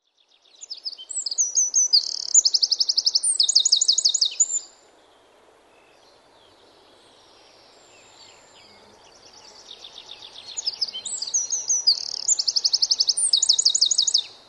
Wren; Roitelet; bbc Bird Song Site

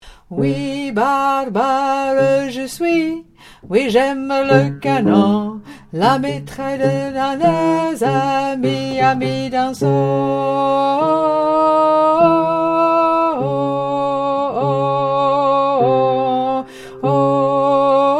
Men

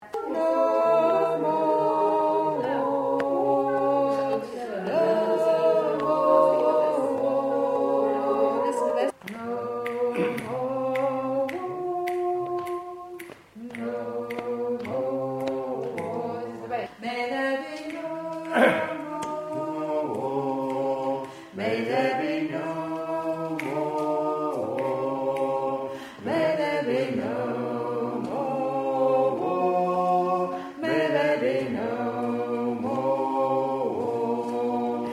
Bass

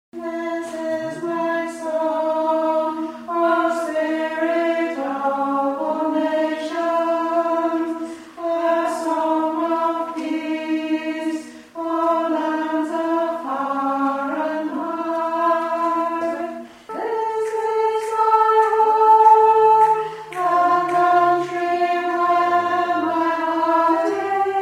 Alto

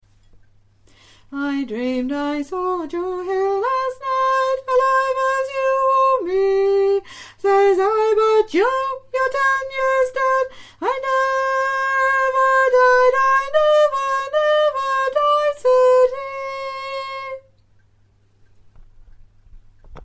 Tenor

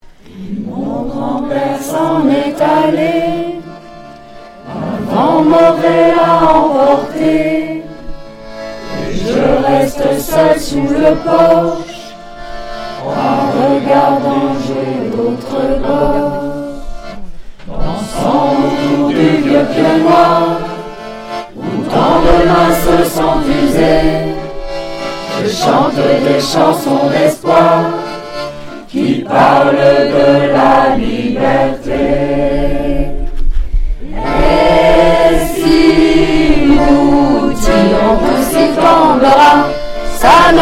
Alto

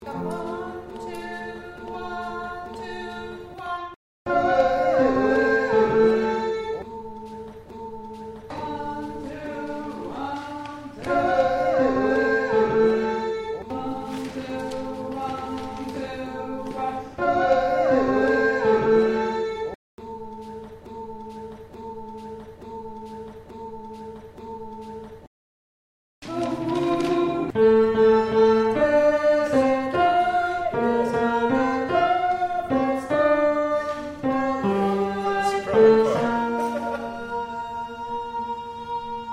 tenor

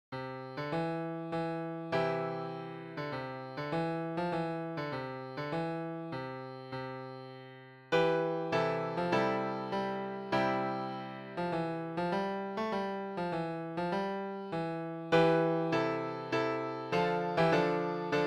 Midi Pno

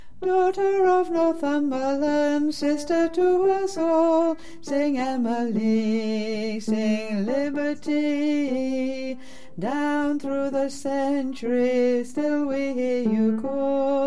Emily Inspires Low

